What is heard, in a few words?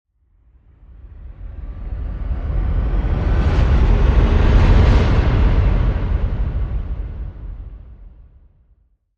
Thunder Rise